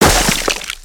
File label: Crates n Boxes, destructibles